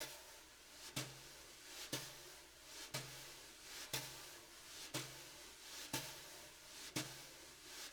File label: BRUSH LOOPS, Living Drums; Sampleheads; Peter Erskine